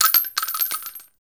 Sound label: Pistol Shell Droppings; weapons; Warframe; Grineer; Orokin